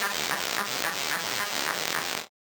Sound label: Casio, Glitched, malfunctioning synth